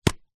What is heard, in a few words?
household hairspray can lid remove